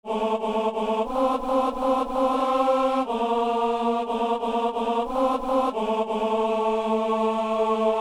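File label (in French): messe simple prière universelle ténor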